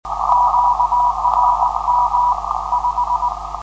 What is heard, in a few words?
qrm5; 472khz qso web